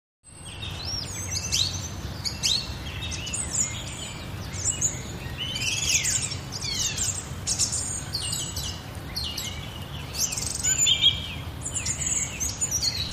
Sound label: Forest Atmos Numerou, heavy; Forest Atmosphere; Numerous Melodic Birds with Light Traffic In Distance.